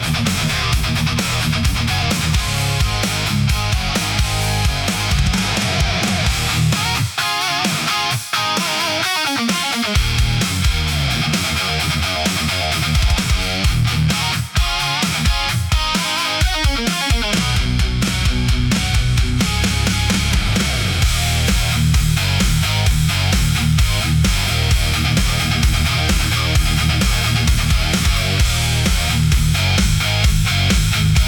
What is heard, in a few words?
hard rock for powerful